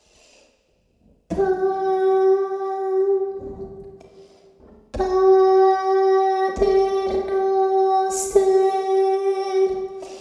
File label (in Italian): soprani